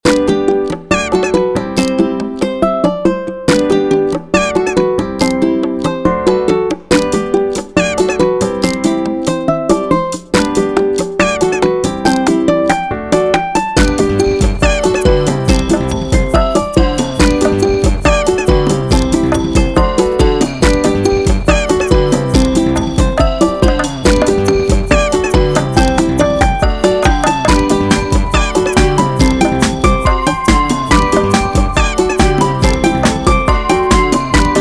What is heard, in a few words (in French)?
Musique amusante pour les enfants